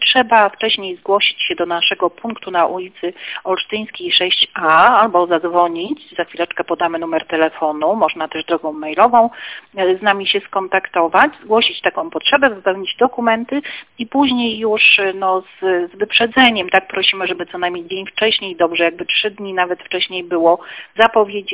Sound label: door